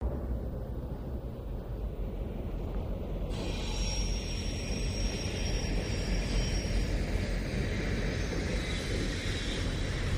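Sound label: Diesel Loco Slow By Brakes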